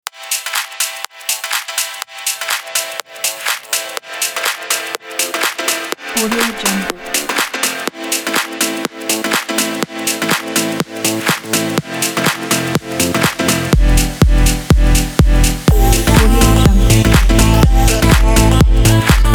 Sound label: future fashion